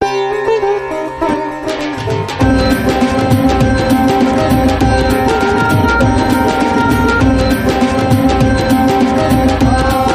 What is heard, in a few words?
Raga, Sting